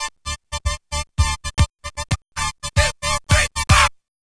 Scratch

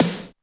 snaredrum, Yamaha RM 50

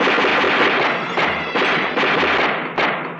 Gunshots in C; Sound FX

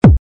kick

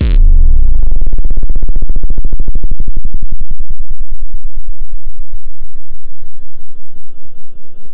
Bassdrum

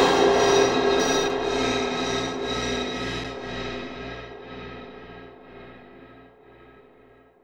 Noise Trail; Sound FX

